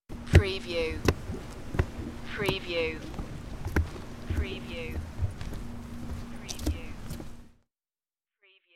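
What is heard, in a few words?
Boots Wood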